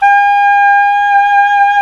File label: SAX SOP MF, Soprano Long